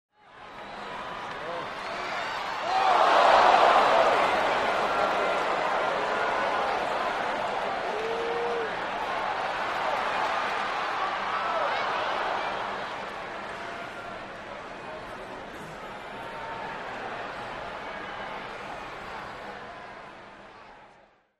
Large Sports Crowd, reactions

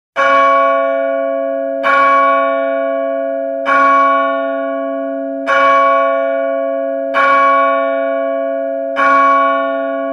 Church Bell Large C3, bell towers

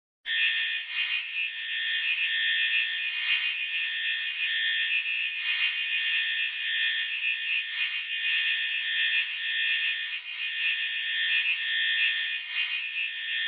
Alarm Beep Pulse; Alarm Beep Pulse Fee; alarms

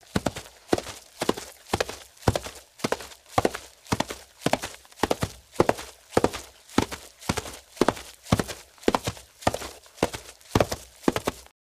Horse Medium Gallop

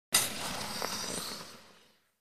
Ice Skating Pro Turn

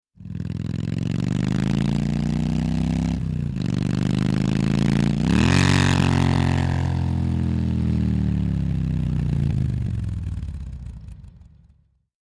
Harley Motorcycle

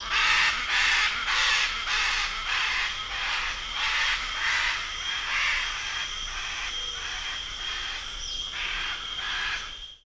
Macaw Angry Caws

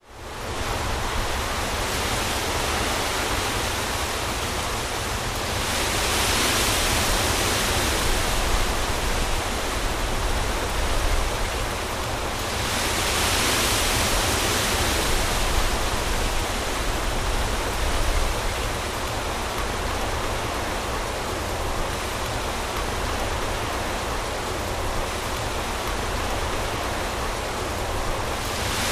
storm, rainfall

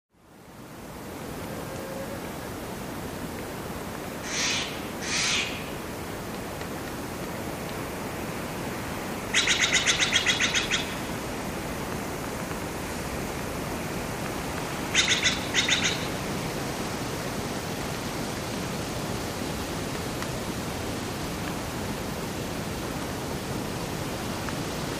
Jungle Sparse Birds; backgrounds

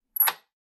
stall door latch; latches & bolts

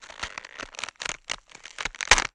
Cabbage Rub Rip; gore stabs & eat

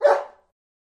Shepherd Dog Bark Ind

